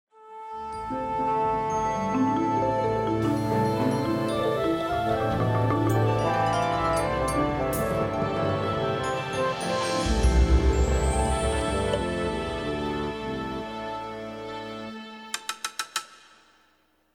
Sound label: Orchestra Tune-Up